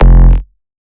Bass F, Bass Synth

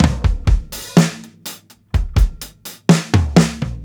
125 HALF TIME TOM FILL, DRUMS LOOPS; BASEMENT DRUMS VOL 2

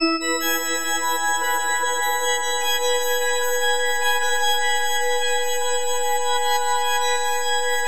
FM E MAJ; Bolder Sounds

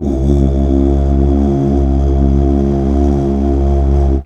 UUH

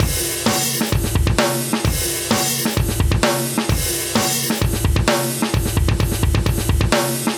130 live breaks

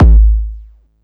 sub, sub bass; Techno ID; single-drums; subbassdrums

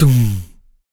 TOMS PITCHD, Male Perc, Vocal; Spectrasonics Vocal Planet; Jazz FX

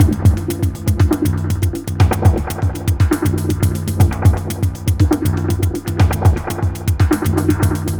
Terrestrial Hybrid, Processed Percussion

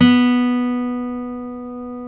folk steel, guitars; Spectrasonics; Hans Zimmer Guitars VOL-1

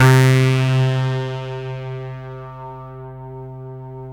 SYN, Wavestation; Sound & Vision - Gigapack I CD 2

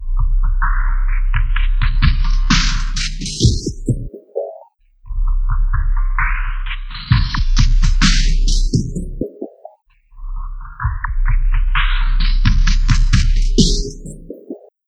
FILTERS, Dark Side Of TripHop; USB Soundscan vol.35; AKAI

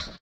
slip slap; Spectrasonics; Metamorphosis

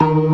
ORGAN, C3 PERC; Keith Emerson Signature Series

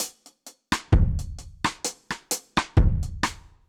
Drums B Dry 130, dub drums; musicradar

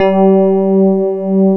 RHDS C5, FM PIANOS

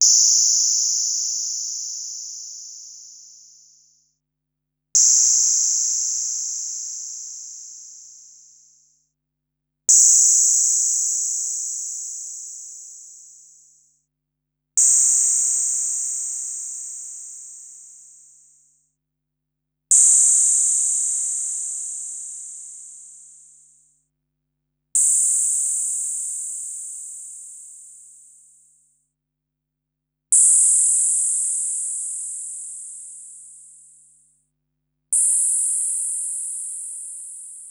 Korg Wavestation-SR Wave 455 - Drum Kit

